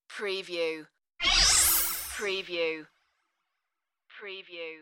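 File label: High Strange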